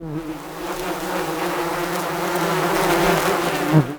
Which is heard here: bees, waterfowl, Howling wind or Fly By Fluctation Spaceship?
bees